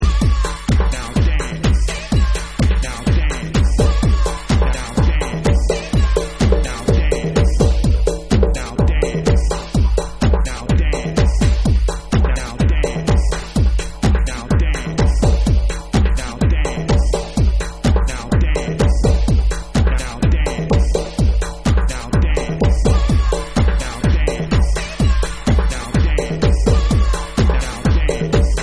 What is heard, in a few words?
DANCE Tribal Mix